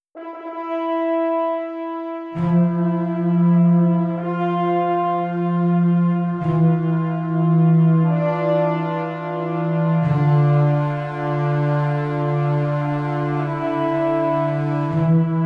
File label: war horns, Suspenseful war horns, right before the real battle